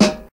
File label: puffy snare single hit g sharp key; snare drum samples